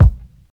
kickdrum a sharp key, kickdrum tuned to the A# note. Loudest frequency: 131Hz; kick drum samples